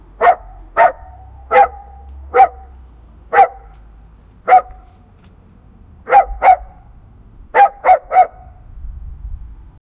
dog barking on the loudly

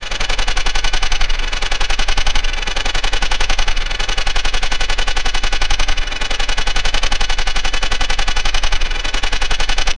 helicopter rotor blade

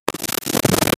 a snow sound break effect

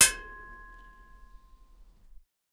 single loud minor sound, clank

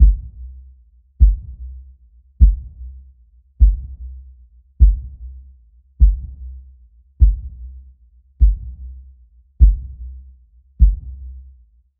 ethno cinematic logo; ambient wind

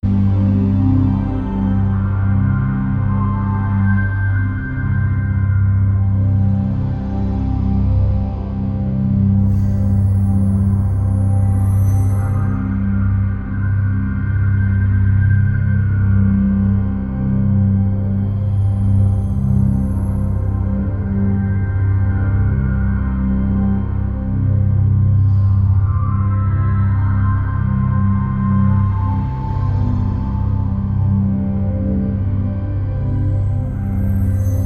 a dark ambient scandinavi; synthwave

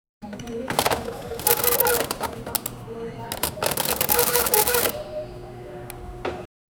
cash register cha ching

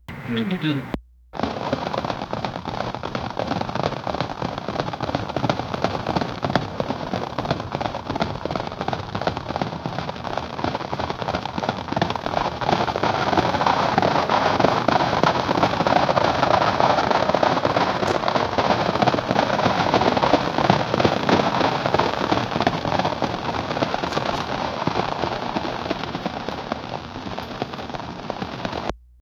distant cavalry riding ac, metal clatter

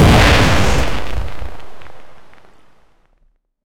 particle

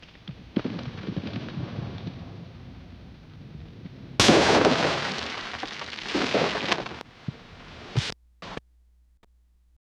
environmental moonlit

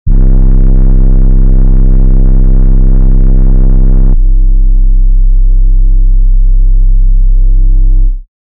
infinite 808 bas

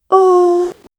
angel voice female short

